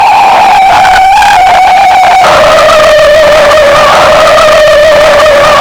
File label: scrub dry, Tires; Kart; AOS RF1 Kart Demo